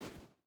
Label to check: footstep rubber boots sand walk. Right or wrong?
Right